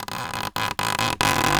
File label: Polystyrene Creak